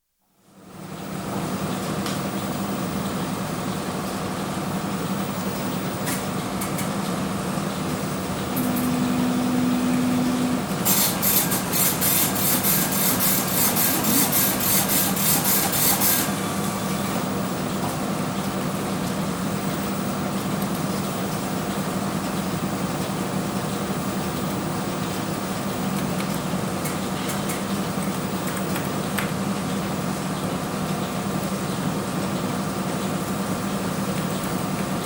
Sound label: Hospital pathology laboratory atmosphere, some indistinct speech; BBC